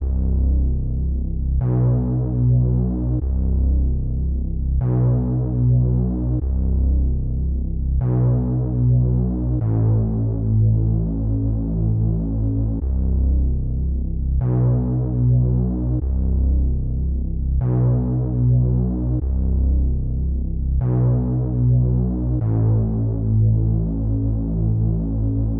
150 BPM E Min Bass; Melody; Reverse; Loop Stems; Cymatics; 2022 Melody Collection; Breathing

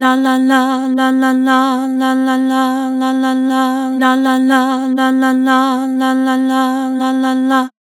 Vibrato "Lah", Harmor Vocals

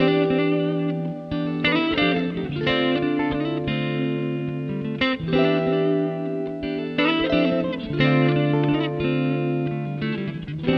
Guitar Loops, 90 BPM A Min; One For The Road; Cymatics; Journey Guitar Loops

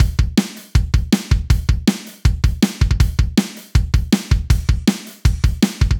Industrial, 160, Main, Drum; Drums; Loops; Drum Construction Kits; Ghosthack; Drum Mega Bundle; Drum Hero 5

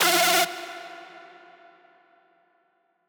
synth screech oneshot F; Synth Hits; GhostHack

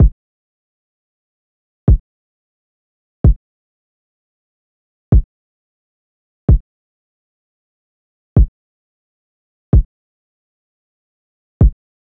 Drum Loop 74 BPM Kick, Lofi; Nameless; Cymatics; ANALOG EVOLUTION Beta

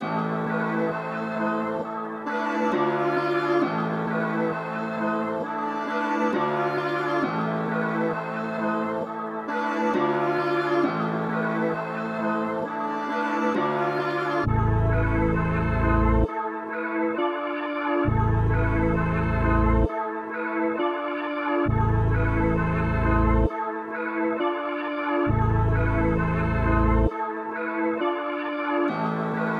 133 BPM A Min, Drill; Smoke

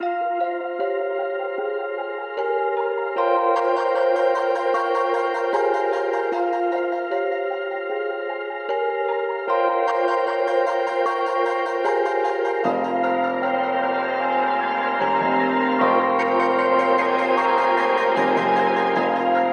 152 BPM E Min, Trap Melody Loops; Firing Squad; Cymatics; Nightmares

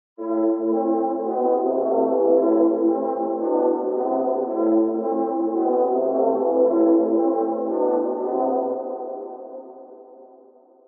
112 BPM A Min Trumpet, Loop Stems; Cymatics - 2022 Melody USB Expansion; Blue Pt 2

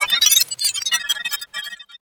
Glitch

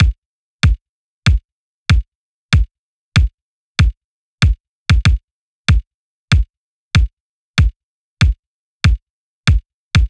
Synthwave Kit 95bpm Kick; Drum Kits; Stems; Ghosthack - Ultimate EDM Drums; Synthwave Kit 07

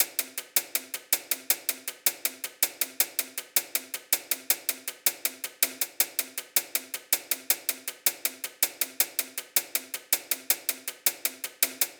Top Loop 80 Annihilate, Percussions; Loops; Ghosthack; Drum Mega Bundle Demo; Abstract Percussions Volume 4

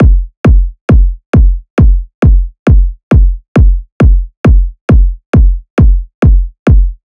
Kick Loop 135, Techno Drums

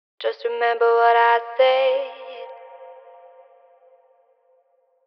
Phrase Wet 80 BPM C Min, Vocals; Remember; Cymatics - Eternity Sample Pack; Phrases

